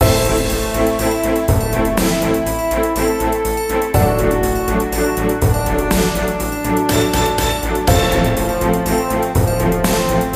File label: Music event